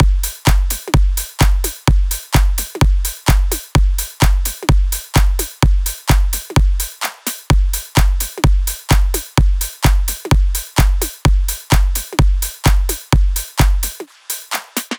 Drum Loop - 128 BPM; House; Full Drum Loops; Velocity; Cymatics

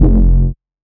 Bass F, techno synth shot; Bass One-Shots; Ghosthack; 45 Free Techno Synth Shots 2025

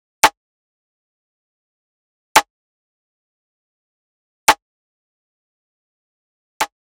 Drum Loop - 113 BPM Rimshot; Loop Stems; Cymatics; Bermuda Pt 2 Drum Loop

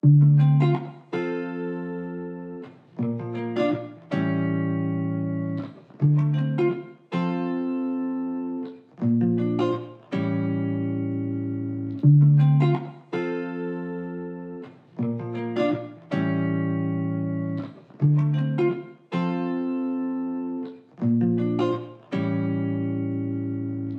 160 BPM D Min Chords, Guitar; Loop Stems; Cymatics; Dreaming

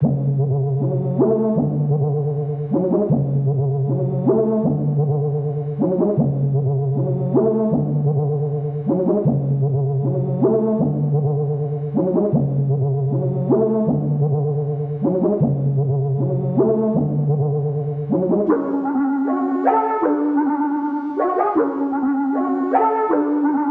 156 BPM F# Min Synth, Loop Stems; Cymatics; 2022 Melody USB Expansion; Tunnel Vision

